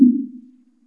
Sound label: go Sound 250Hz; 残響時間（チューニング前）; 低音域は定在波または壁振動の減衰時間; 測定ポイント：シスニングポジション